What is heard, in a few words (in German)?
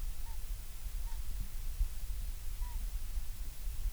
mucker, octodons